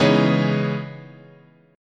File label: C sharp Minor 9th, chord